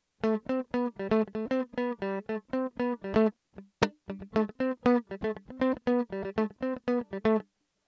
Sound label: mandolin comparison electric noodles